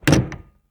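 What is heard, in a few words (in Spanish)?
CERRAR PUERTA, close door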